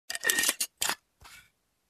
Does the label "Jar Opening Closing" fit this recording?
Yes